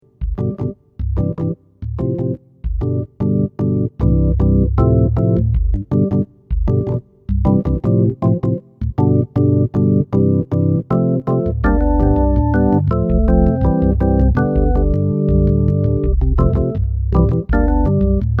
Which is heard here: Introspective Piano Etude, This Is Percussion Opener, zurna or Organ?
Organ